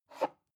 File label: flag large loop